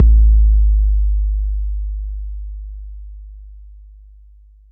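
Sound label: bass drum 808 Moog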